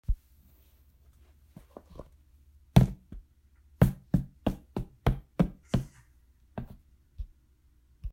Footsteps Running

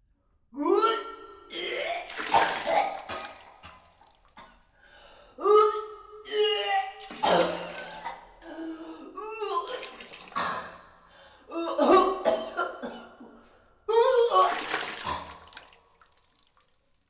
Woman Vomiting

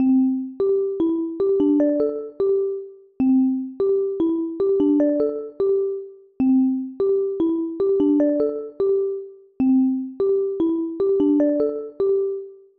Simple Ringtone

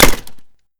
Simple Gunshot Reverb

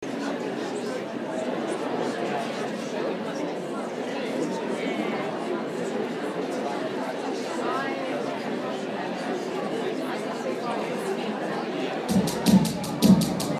Cafe Ambience

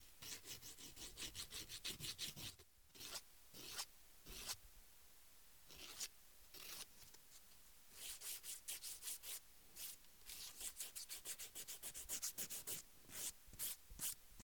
Sandpaper

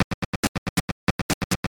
Drums

